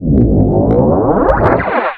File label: power; touhou-reimpl